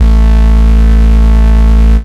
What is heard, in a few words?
bass techno; Ueberschall; Techno Trance Essentials